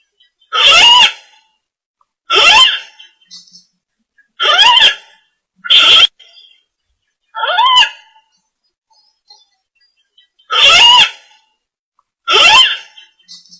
Tieng Nhai keu

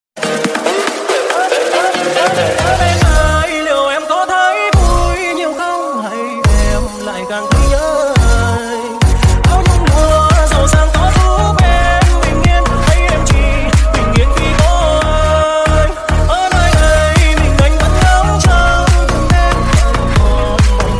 Nhac chuong

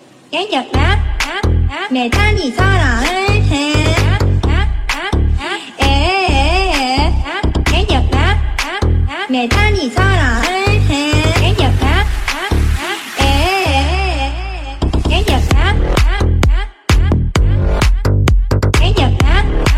Nhac chuong remix

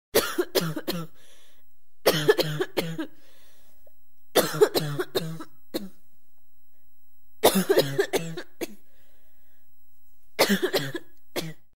Am thanh tieng ho phu nu

